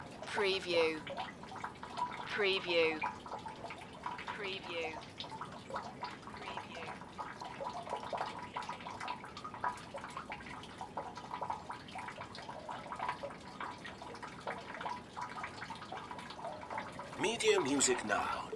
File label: Rain Well